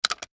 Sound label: seat belt fastened